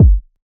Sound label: kick; eddyflux; crate bd; dough-samples